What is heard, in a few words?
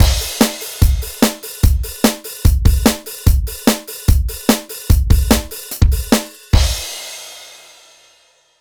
Rock Drumloop; Drumloops; Dr. Fink's Funk Factory